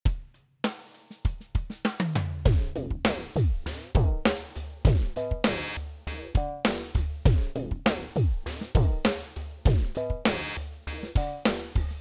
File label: line mbox pro, external audio interface; m-audio; fast-track-c600